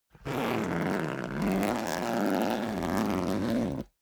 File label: zipper down jacket open slow; objekte; reissverschluss